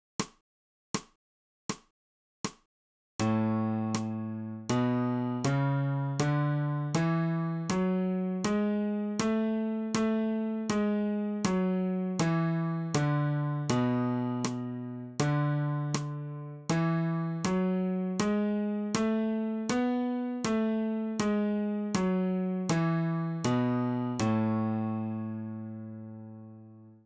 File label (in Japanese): 新フレットレス